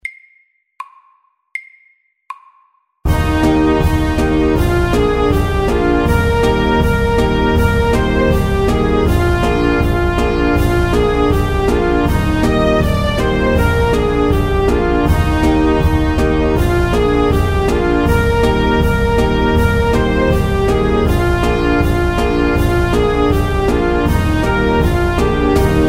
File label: Cancan, 80